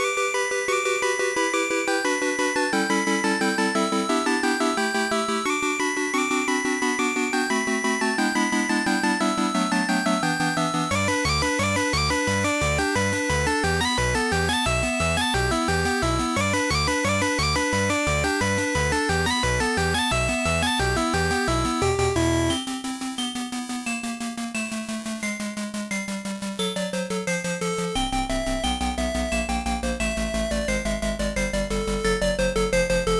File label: Yi Folk Song; creative music system; ibm pc xt at; Happy Luoso